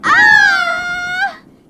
AAAAH

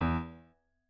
piano

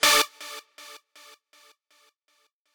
lead

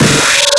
breakbeat

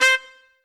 Trumpet melo

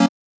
Chord

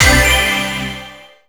SE ENTRY AIME OK; maimai

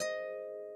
harp

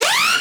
wobble

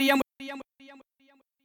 voice

